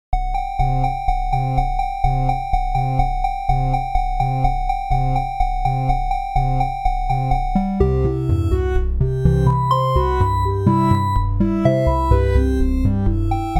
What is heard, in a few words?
Sonification Sub Genomic RNA